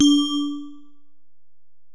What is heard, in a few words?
Bell, Alesis DM-PRO